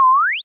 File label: turret; Worms